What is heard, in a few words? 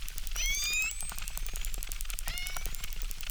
Chirp, acquarium